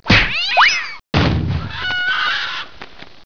kick; matou

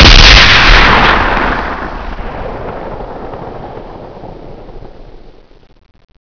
Spring shoot

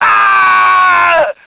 AAAAAA

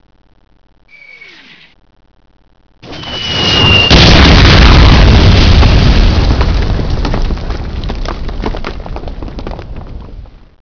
arty hit

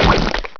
SPLURT

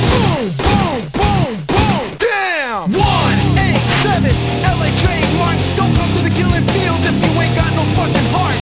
Bang

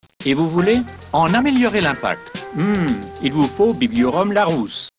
hmmm

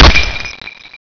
30 cal shoot

